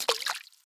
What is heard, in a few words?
lure drop down